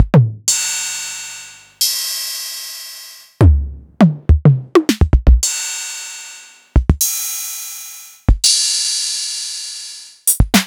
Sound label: DMX606